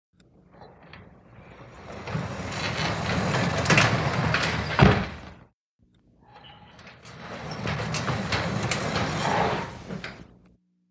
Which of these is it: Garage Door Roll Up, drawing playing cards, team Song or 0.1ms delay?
Garage Door Roll Up